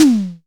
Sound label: Tom Fat Short, Tama TS-206